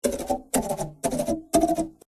instrument nukulele lead

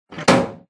trashcan open; FO76

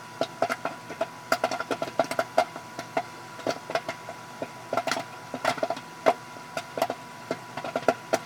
popcorn machine

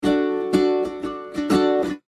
instrument nukulele rhythm

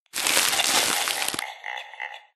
spooky treatbag pickup

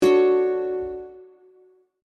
instrument nukulele outro

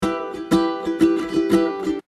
instrument nukulele rhythm

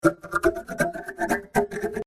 instrument nukulele lead

